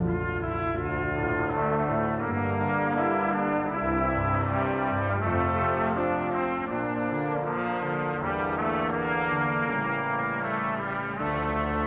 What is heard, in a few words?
horns, Loops